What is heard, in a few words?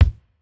pop kick; japanese pop